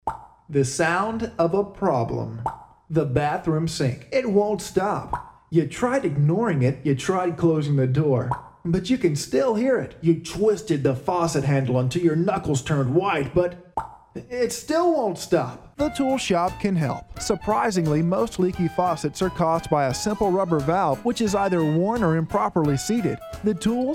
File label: Drippy Faucet; Tool Shop